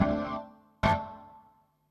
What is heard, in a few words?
synth house 125 A; eJay; Keys